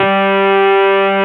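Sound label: Wurlitzer G2 - L; USB Soundscan vol.09; AKAI; Keyboards Old School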